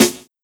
Treble Heavy Snare Single Hit D Key; Duration 0:01; Channels Mono; snare drum samples

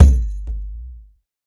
low mid tom drum single hit g sharp

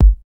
old school wet kickdrum g sharp key

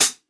clean closed high hat sound g sharp key

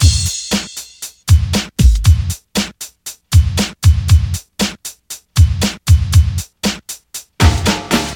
117 bpm modern hip hop breakbeat sample e key

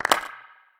clap sample c sharp key; single hits one shots

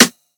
focused snare b key

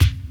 old school studio kick drum single shot f key

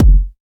steel kick drum sound F sharp key

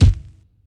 thick bass drum sound f sharp key; kick drum samples

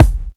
rich mids kick drum single hit f key; kick drum samples

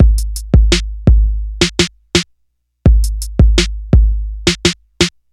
112 bpm breakbeat sample g sharp key

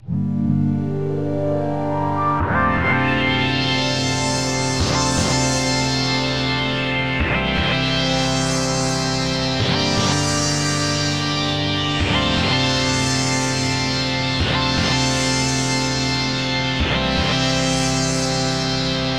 synth arp romantic 100bpm; synth loops

